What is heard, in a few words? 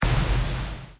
explosion; pou